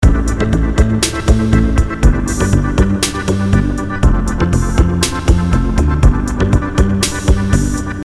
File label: Loop